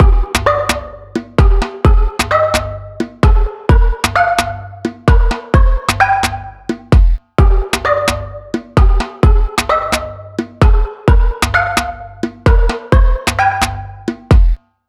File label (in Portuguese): BEAT COM PONTINHO